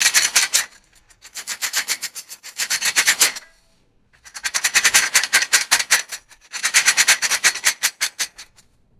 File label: brass metal screen shaking and rattling